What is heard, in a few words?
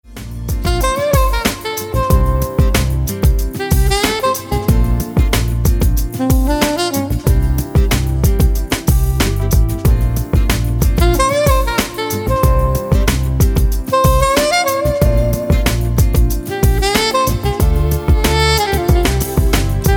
Jazz